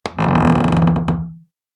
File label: Door squeak creak